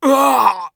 scav death, voice; Escape from Tarkov; scav5

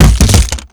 weapon container plastic; Call of Duty Modern Warfare; physics

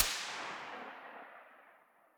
vss distant tail; vss loop; Escape from Tarkov

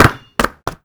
physics car tire bounce

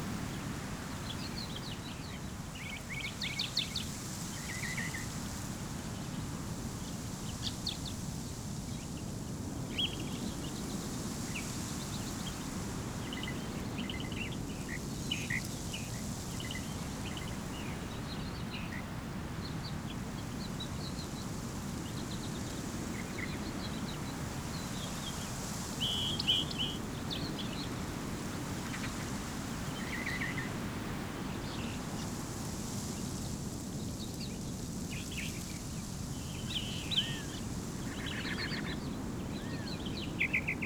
ambience forest; Rust

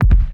damage hits explosions; World Of Warships

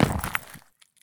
landing glass; Escape from Tarkov

